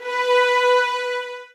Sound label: SOFT VIOLINS